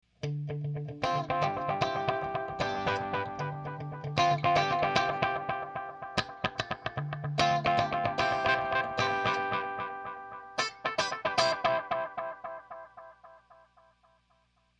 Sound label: analog